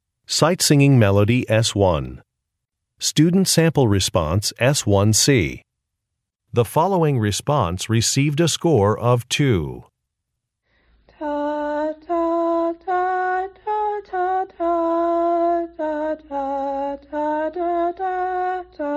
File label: music samples; Sight-Singing